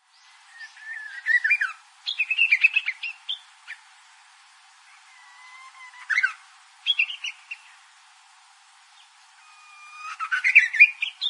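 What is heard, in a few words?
Japanese bush warbler, hyogo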